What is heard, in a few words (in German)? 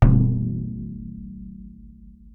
Frame Drum Im Kreis